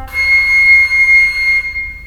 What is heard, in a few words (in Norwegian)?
floyte high